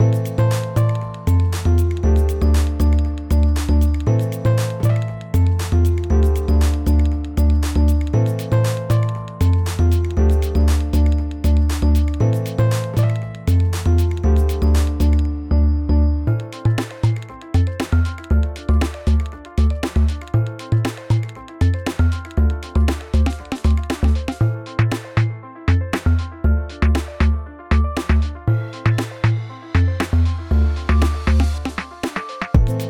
Video Game Collab (Cartoony, Simple Melodic, Dancehall)